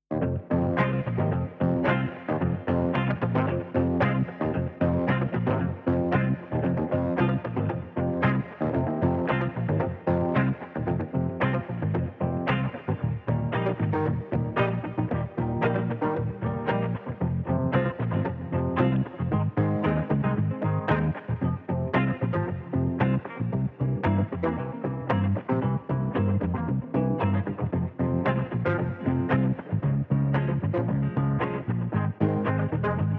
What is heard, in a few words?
SOLO CHICO; TEATRO MUSICAL